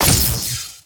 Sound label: hit